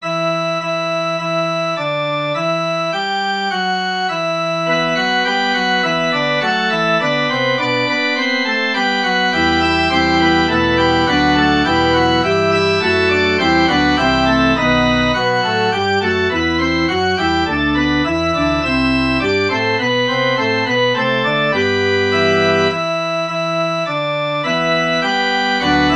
forspil; ddk 134 forlen os freden herre nu

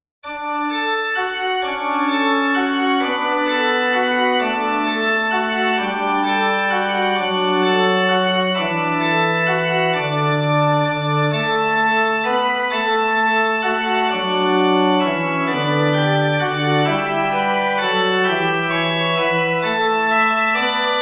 præludium

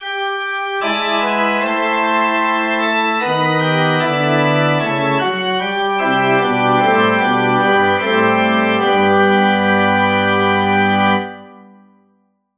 forspil min jesus lad, organ

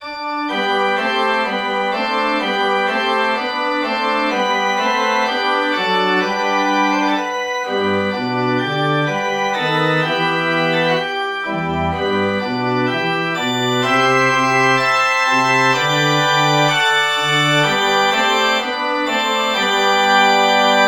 forspil vi pløjed, organ

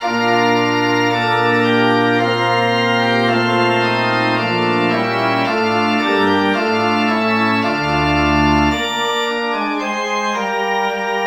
forspil; under dine vingers skygge

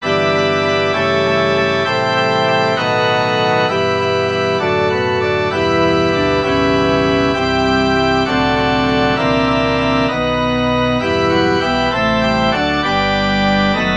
harmonisering herre du vandrer forsoningens vej, organ

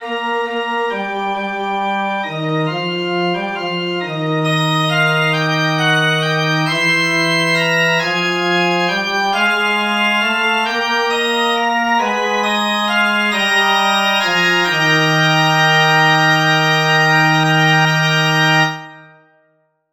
forspil

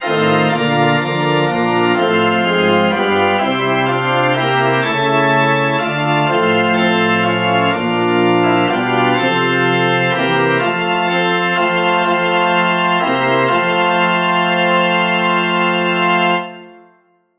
forspil

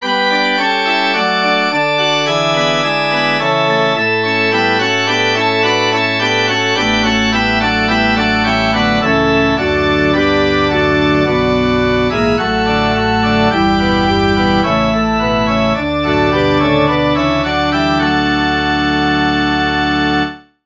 forspil; op dog zion ser du ej

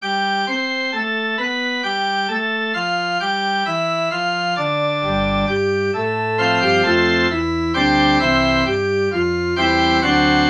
forspil; du gav mig o herre

